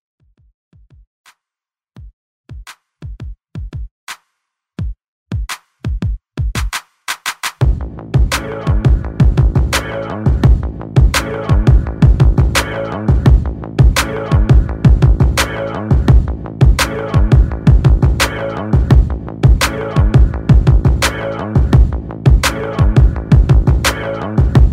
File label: Pop Redrum